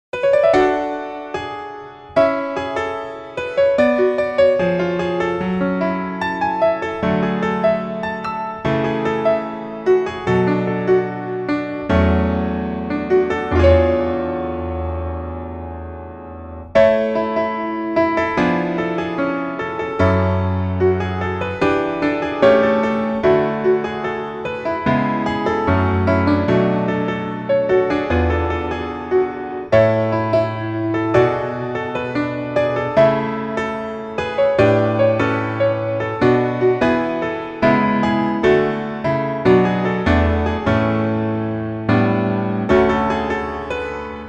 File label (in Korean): Piano ver, 멜로디MR, 남자키